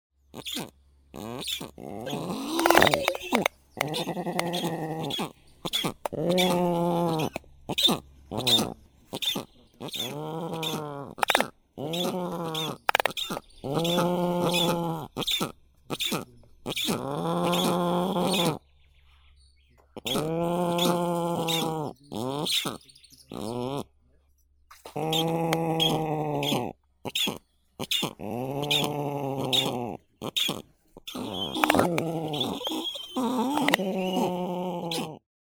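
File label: bird voices, black stork, Ciconia nigra, chick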